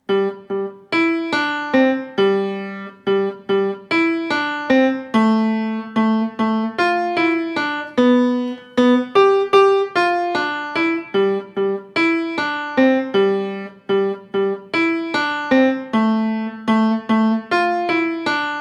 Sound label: Jingle Bells